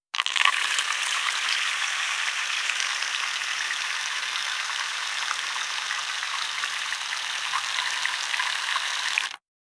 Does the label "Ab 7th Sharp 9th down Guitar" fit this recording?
No